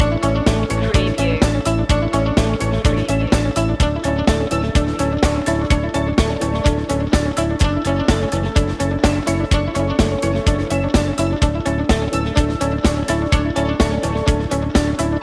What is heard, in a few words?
inspiring day loop